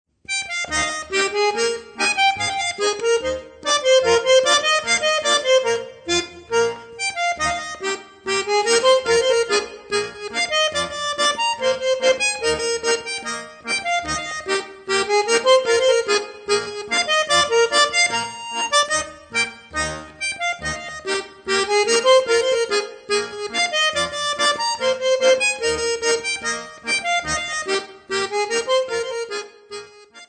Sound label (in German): polka